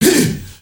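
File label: MALE "HEHE", Heart of Africa, shout; Spectrasonics; Heart of Africa 1; CH. SHOUT2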